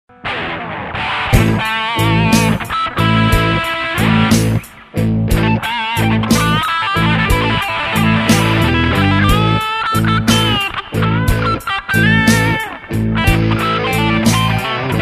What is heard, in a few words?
blues